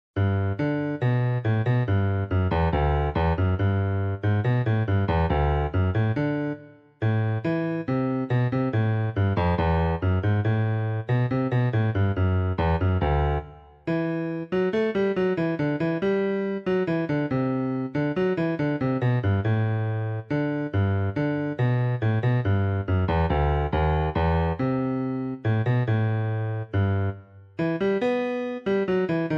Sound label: bassoon etude